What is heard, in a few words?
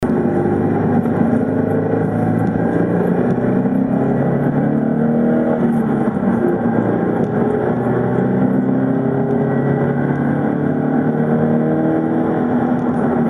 BRZ sound